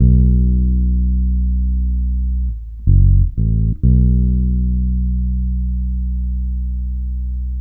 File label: FEAR B, Bass Grooves; USB Soundscan vol.30; AKAI